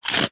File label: human eat crunch bite single dry cereal piece